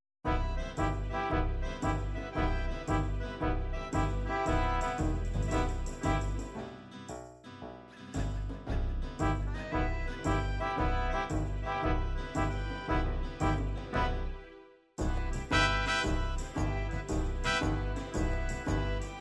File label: tarantela tarantella, midi